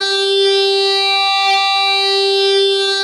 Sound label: synthetic, Voice; Notes